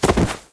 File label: drop